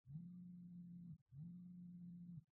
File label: cell phone